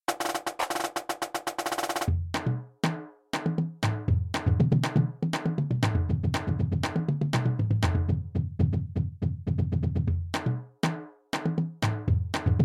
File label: Skyline Groove